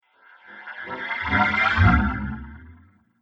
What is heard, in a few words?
Dance Riser